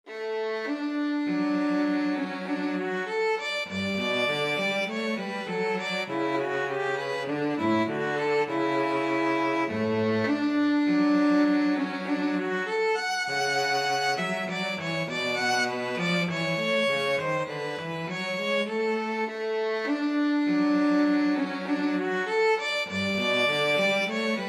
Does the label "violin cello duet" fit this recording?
Yes